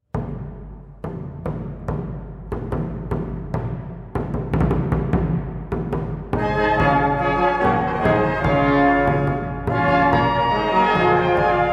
Intrada A 6

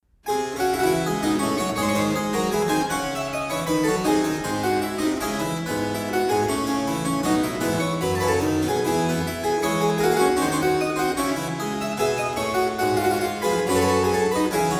Suite in D Minor, Courante; Handel

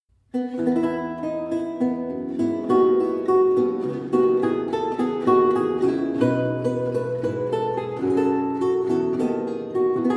Sonata in F major

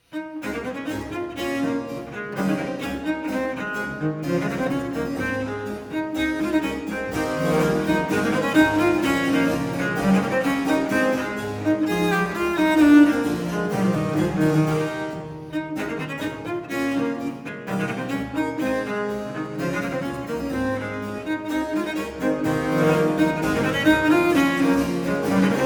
Viola da gamba, Sonata in A Major, Vivace

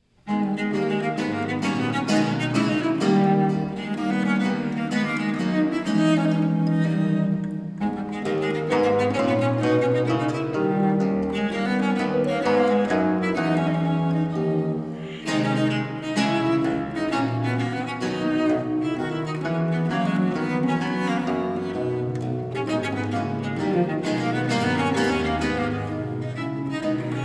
Sonata in A minor, Gigue; G.A.Paganelli

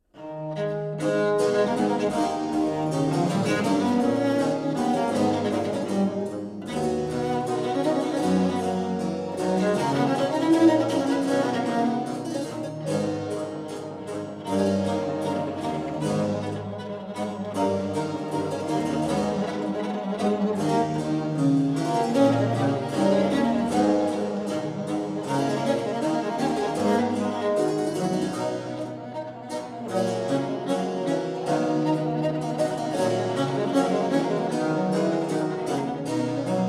Cello sonata, A minor; Antoine Dard

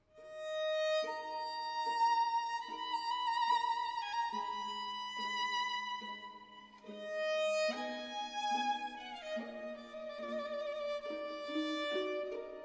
concerto b minor; RV 390

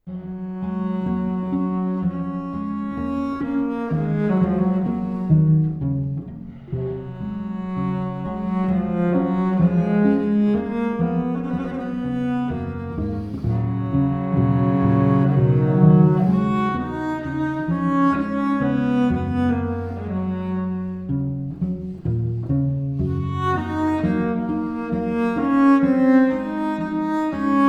Viola da gamba Sonata in G Major Adagio; Abel

